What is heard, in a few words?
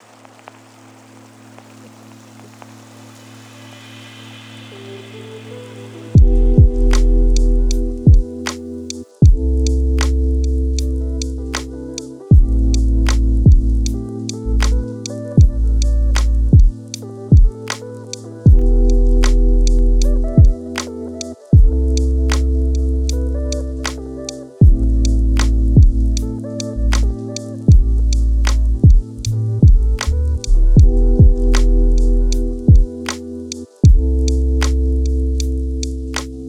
lofi hip hop